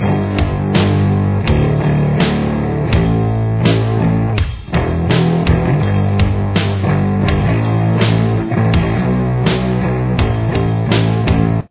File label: chorus, guitar